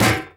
physics turret gibs; Portal 2

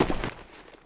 mud, footsteps

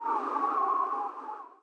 wind

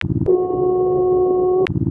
headcrab canister ambient, canals; Portal 2

